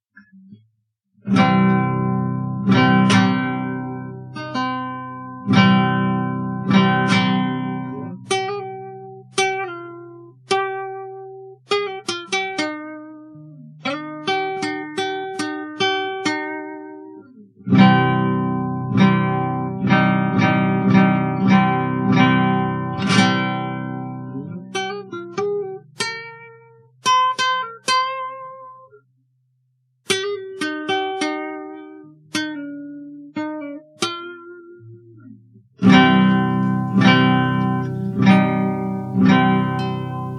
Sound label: instrumental